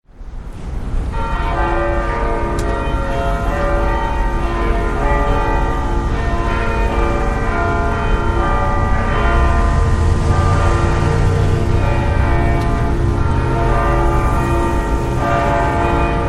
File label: chibells